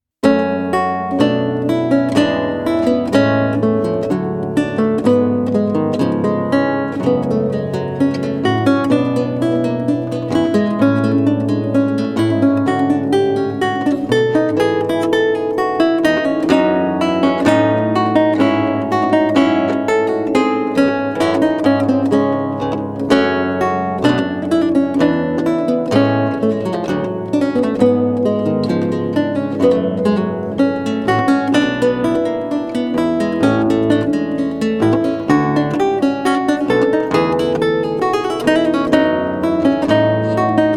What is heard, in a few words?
Lute Suite in G Minor, Gavotte Rondeau; BWV 995 - V. Gavotte I-II; Bach; JS Bach - Complete Music for Lute